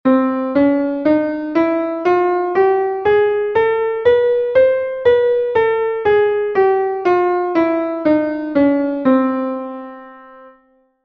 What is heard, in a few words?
9edo scale